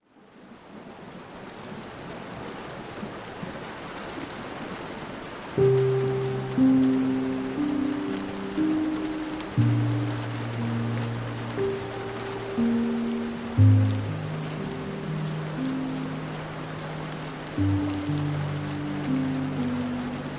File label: Rain Sounds Meditation; مدیتیشن